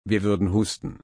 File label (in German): husten